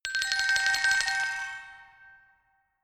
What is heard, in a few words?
glockenspiel mysterious tremolo high short; percussion